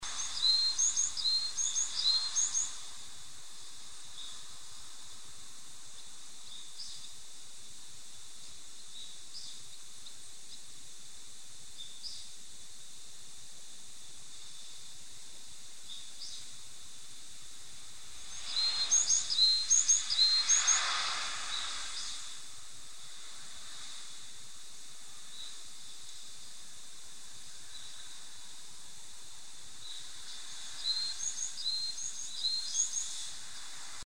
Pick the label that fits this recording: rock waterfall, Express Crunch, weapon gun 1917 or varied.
varied